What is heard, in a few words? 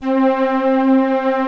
OB, OB modular; Greytsounds VOL-1